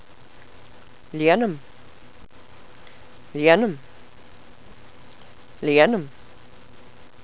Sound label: fill